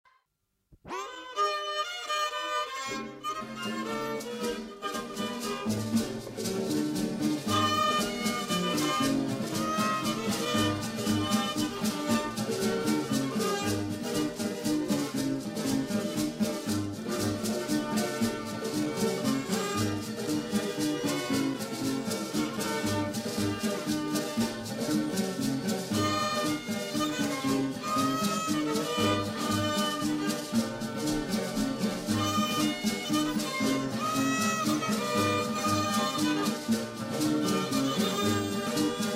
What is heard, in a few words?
Mazurka